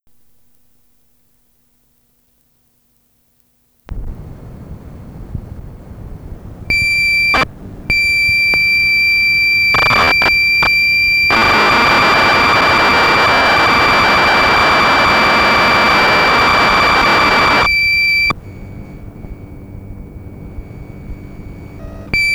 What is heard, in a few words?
tape side